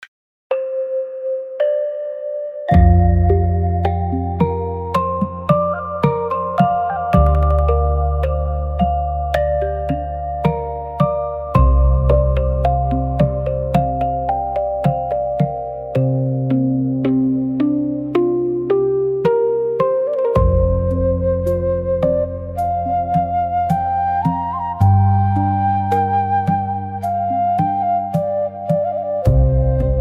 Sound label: backsound